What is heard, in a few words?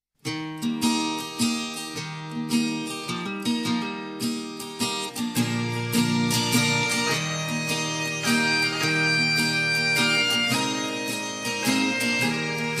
single walz; Ritas Waltz